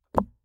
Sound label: Plunger